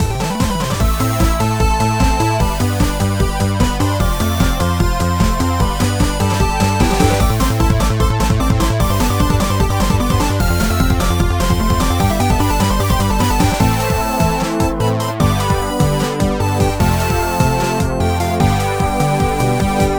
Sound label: Bgm firework skill; trial